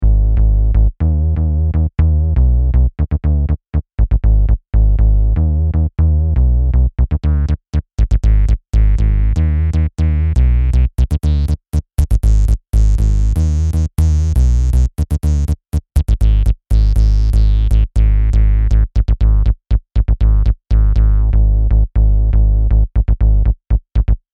Fantom-G6 New ARP Style

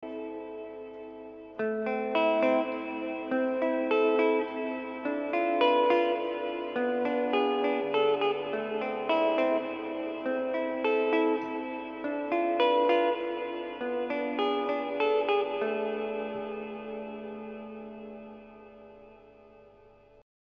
Hall; Silverlake

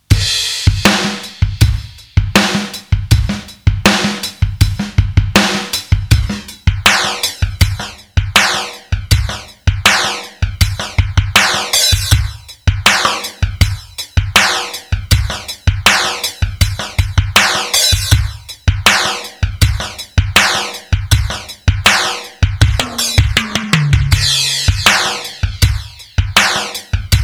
Alesis Midiverb2 Triggered Flange

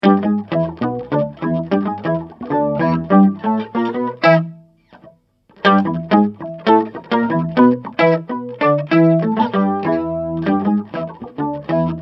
overtone

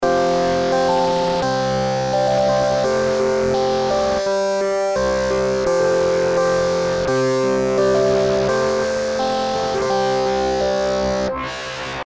Sine; Arturia Stage 73 V2; Dist TUBE CULTURE; Sine Shapperd

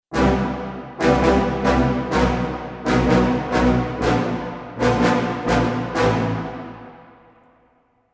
Brass Ensemble Staccato

